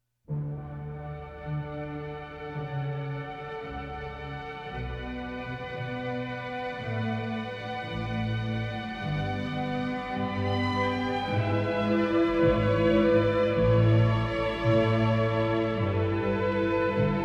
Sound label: Orchestral Suite 3 In D Air On A G String; Bach; BWV 1068